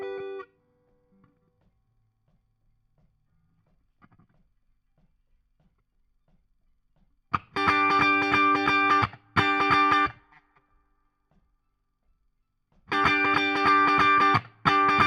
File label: Guit Solo Back